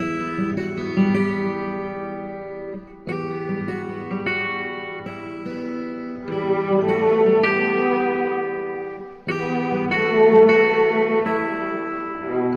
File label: guitar and strings, Loops, Sad